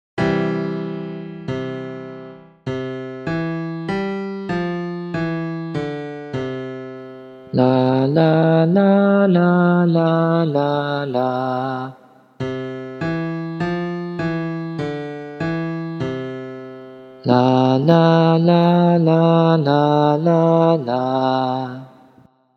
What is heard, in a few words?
Melody Repetition Demonstration